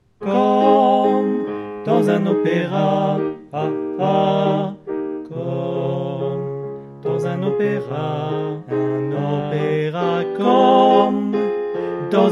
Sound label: Refrain Tutti